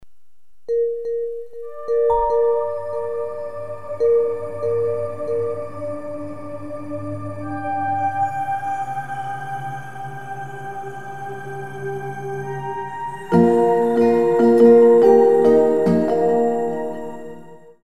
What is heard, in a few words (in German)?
Atmosphaerisch